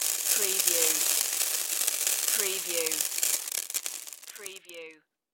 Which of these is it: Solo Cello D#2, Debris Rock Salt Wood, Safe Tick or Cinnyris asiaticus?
Debris Rock Salt Wood